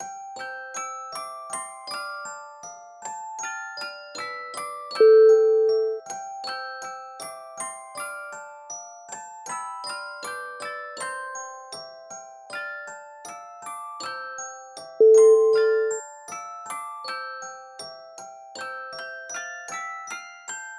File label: baby music box